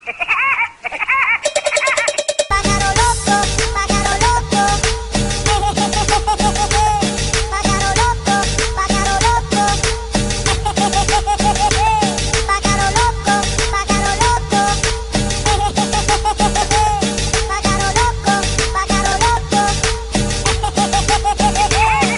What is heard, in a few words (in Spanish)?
cumbia